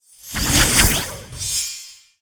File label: teleport; hero nivus